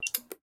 Console Off; Game rip; MiSide

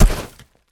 Enemy Boss Fall; Game rip; MiSide

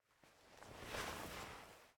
Player Hand Use Kick; Game rip; MiSide

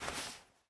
Bow Drop; MiSide

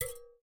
Door Count; raw game audio files; Chibi; MiSide

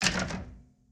Lamp Start Move; Game rip; MiSide

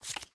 Drop Card; Game rip; MiSide

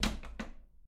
Door Now Open; MiSide

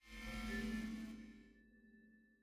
Ring Check Player; MiSide

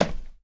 Dance Step; Game rip; MiSide

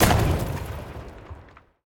Ship Damage; MiSide

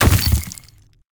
Crack; MiSide

